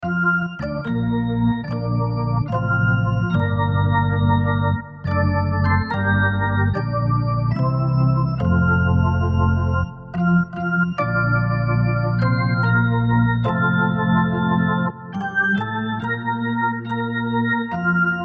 National Anthem, Music National Anthem United States Organ; Concerts; SPORTS; Concert Sound Effects; Blastwave